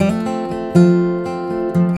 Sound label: GUITAR 120; Best Service Advanced Media Trax Modern Composer